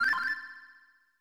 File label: Whistle; RPG Maker; SNES